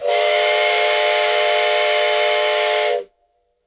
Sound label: Very Loud And Long Steam Train Horn